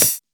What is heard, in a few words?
Open Hats, Cymbals